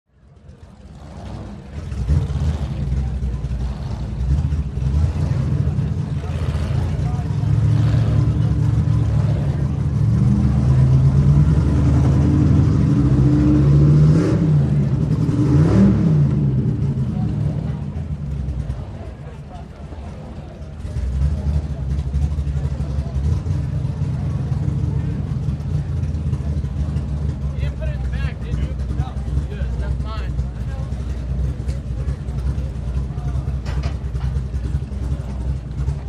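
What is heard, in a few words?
Power Boat Race Ambience, off shore power boat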